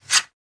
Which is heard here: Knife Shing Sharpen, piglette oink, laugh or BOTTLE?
Knife Shing Sharpen